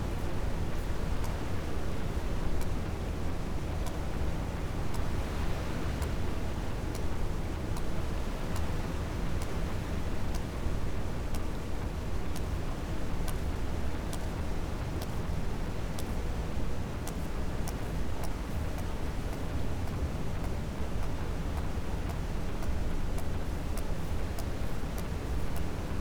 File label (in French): cachalot positif, Physeter Macrocephalus